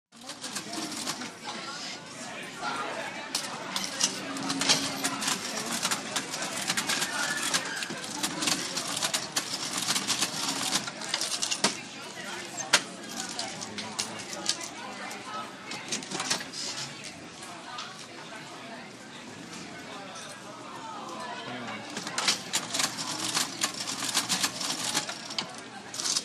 RESTAURANT Background CAFETERIA: College cafeteria, at cash desk, manual register.; restaurants & cafes